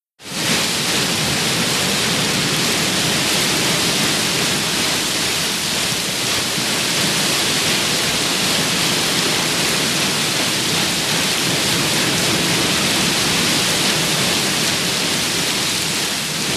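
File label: Paddle Wheel Steady Wash; BOATS & SHIPS PADDLE WHEEL: EXT: Steady wash close-up.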